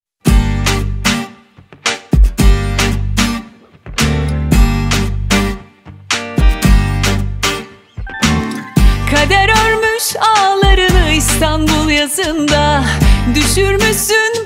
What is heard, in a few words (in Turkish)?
Jenerik Muzigi; Seversin